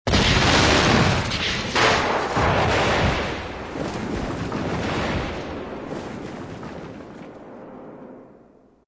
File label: big explosion